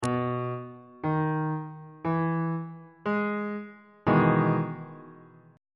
f flat major 7th 2nd inversion

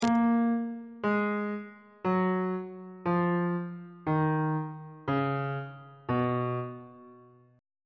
b lydian mode descending

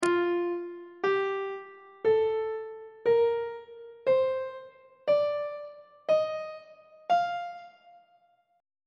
f mixolydian mode note positions on treble clef

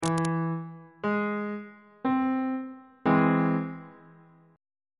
b sharp augmented 1st inversion on bass clef

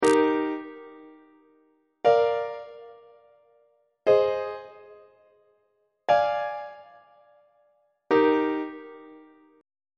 e flat major leading tone iac on treble clef

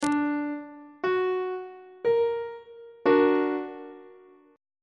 d augmented chord; treble clef

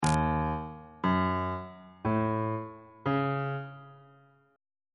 e flat half diminished 7th chord intervals

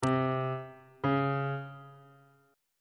c 1st interval pitches on bass clef

